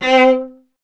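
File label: strings cello C; Miroslav Vitous; Solo Instruments VOL-1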